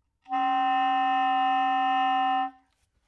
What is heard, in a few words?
noise